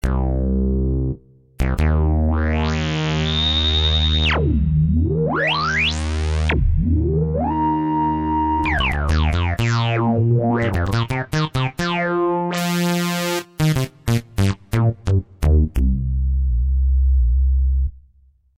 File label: Fast Saw Synth, KORG